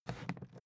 briefcase plastic slide; industrie wirtschaft; bueroarbeit

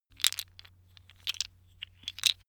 tiles wood clatter; objekte; holz

